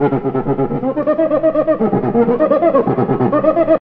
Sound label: cuica, funky drum loops